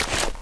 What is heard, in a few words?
Forearm, M4A1 Carbine; cstrike